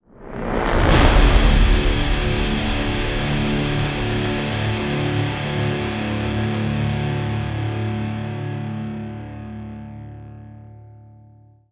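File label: sting, brass swell; transformers war for cybertron gamerip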